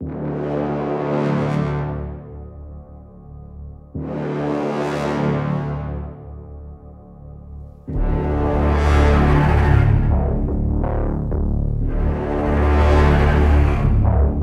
Ship Crash; Black Sky

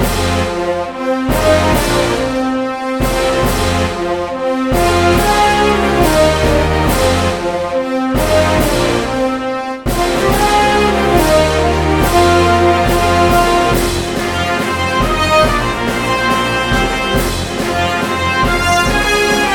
Rpg Loop; The Legend of Gwen; Windows

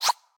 Join Team One; Boomerang Fu

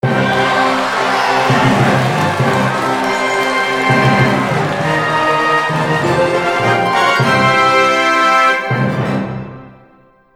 Scroll Win; Clash Royale

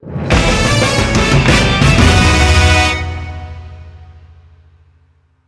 Result [Win] [Victory]; Mario Golf - Super Rush

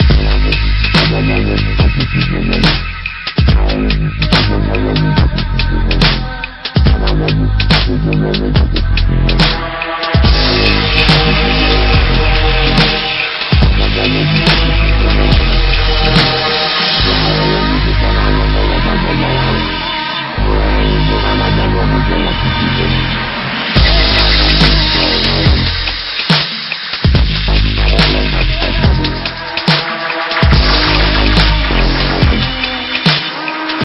Arena Background; Pixel Gun 3D

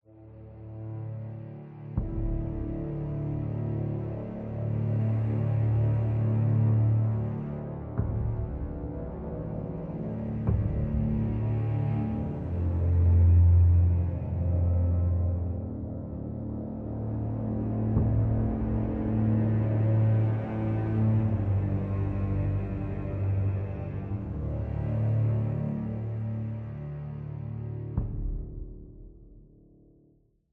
Suspense

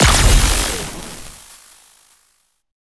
Glass Break; helltaker

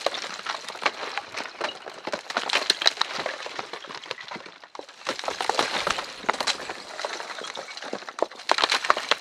Floor Cracking; Boomerang Fu

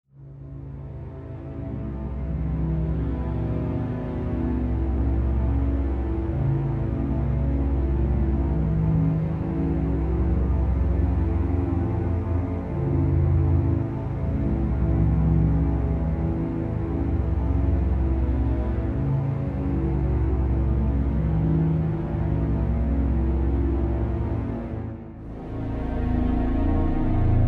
Mood Music; F.E.A.R. Extraction Point

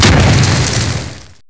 explode grenade

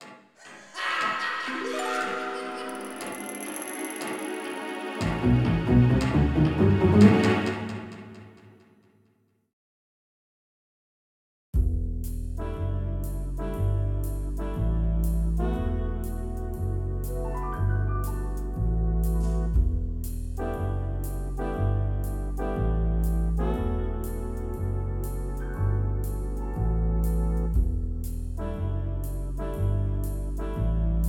Mysterious; miitopia

